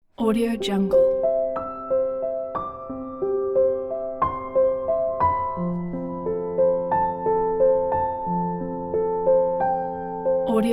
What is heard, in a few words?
calm simple piano